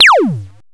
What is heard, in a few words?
Classic laser